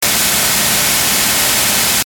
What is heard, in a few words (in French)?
bruit blanc